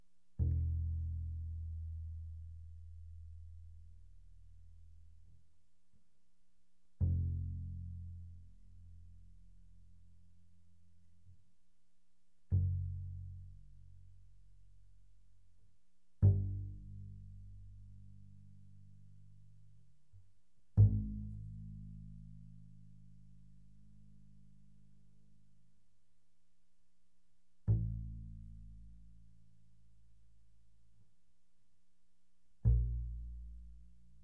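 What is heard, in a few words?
Bass pizzicato mezzo forte sul E E1 B1, doublebass; Strings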